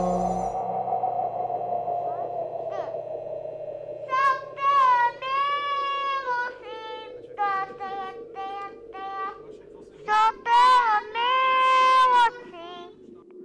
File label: Drums Blues